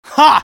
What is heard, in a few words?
laugh short